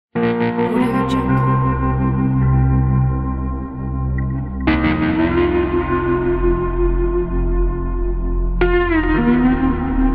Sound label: western guitar